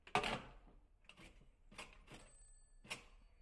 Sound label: payphone end